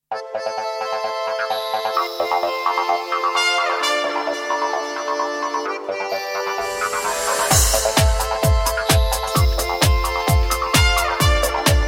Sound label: english song remix; samsung